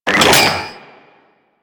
Sniper Reload; DE; Warframe; Weapons; Sentient; Crp Sent Amlg Sniper

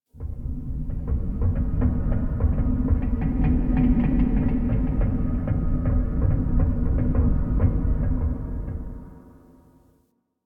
Metal Creak Medium; Ambience; Warframe; The New War; Quests; Zariman

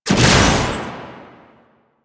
Shot, Spear; Warframe; Weapons; Tenno; Priest; Scourge Prime

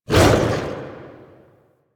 Axe Weapon Swing; Warframe; Tenno; Wolf Frame

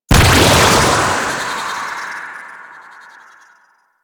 Void Lure Explosion; Generic Abilities; Mods

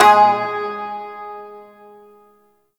Shawzin Oct One G Sharp; Warframe

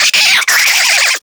Infested Minion Bark, Vocals; Warframe

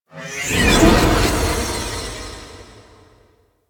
Sculpture Transition; Mountain Pass; Warframe; Ambience; The War Within

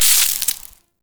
Electricity Trap Loop Stop; Venus; Warframe; Veilbreaker; Kahl

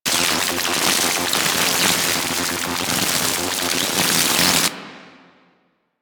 Microwave Pistol Projector Loop; Grineer

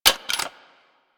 SMG Alt Mech; Weapons; Warframe; Tenno; Sundial

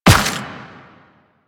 Run Dirt, Footsteps

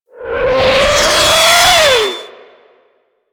Artillery Whizzby; Enemies; Abilities; Teralyst

